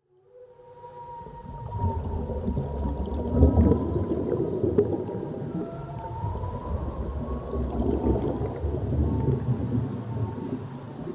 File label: Songs of Dolphins and Whales